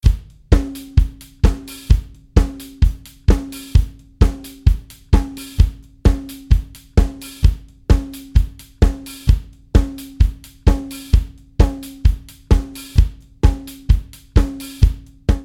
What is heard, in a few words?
Song1 Drms Unmixed